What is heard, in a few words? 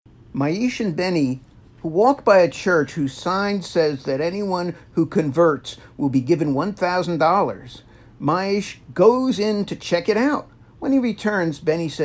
Laugh Track; Calvin Trillin; Joke